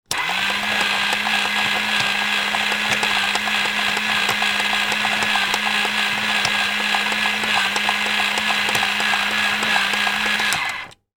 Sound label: toy car race track lift motor operate